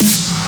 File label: SN Big; Multi Drum Loop 18